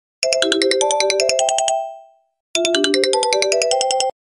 ringtone; Sony Ericsson

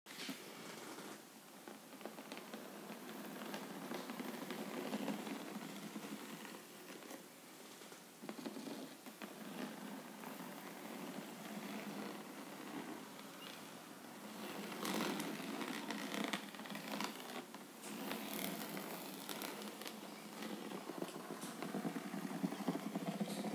Nail on box; ناخن روی جعبه

